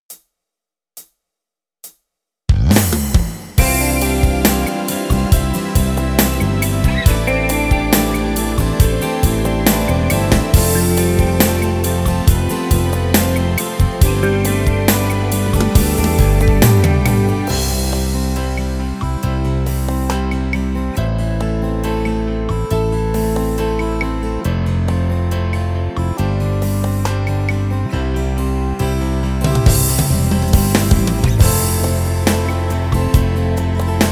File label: Rock Ballad